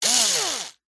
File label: industrial electric cordless screw driver or drill very short burst; zapsplat